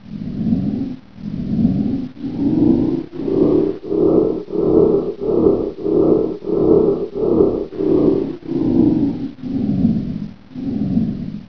reactor fan; colonyx

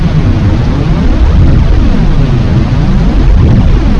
warp; valley

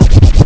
mat scratch; phatmat

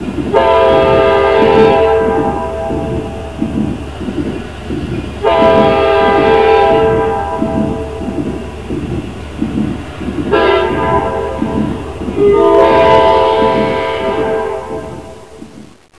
train horn

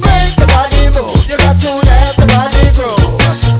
beat

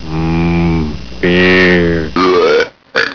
beer blch

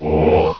open; maya

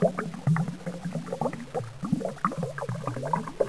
slurpy, ambience

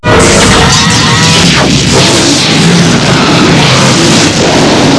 sg splash; stargate

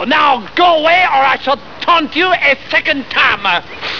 windmill; duckhunt

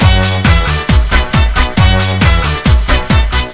beat

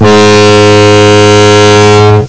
horn; phatmat

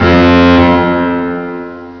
big warning; cydonia

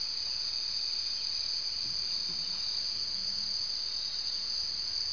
western cicadas

